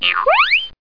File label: jumps; How Many Bugs in a Box